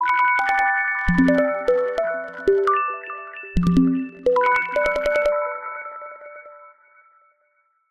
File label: htc mum ringtone